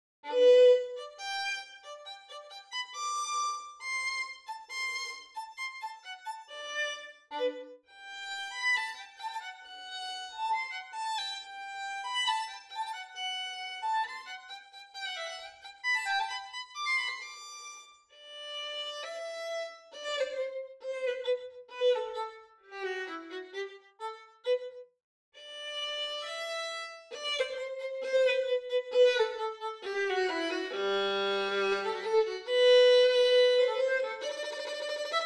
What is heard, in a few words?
Violin; Eine Klein Nachtmusik prepared for ctools by Shegolev